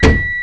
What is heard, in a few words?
Coolant Dump Start